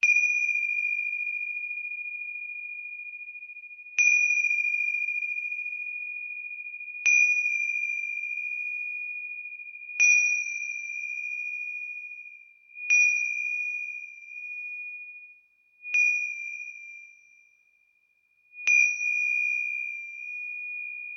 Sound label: Nepal cymbal